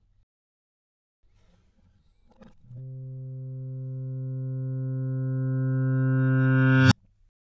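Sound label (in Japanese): Steel弦 C3 逆転, ギター時間軸逆転音; 時間逆転音; 減衰音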